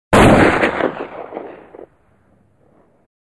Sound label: gun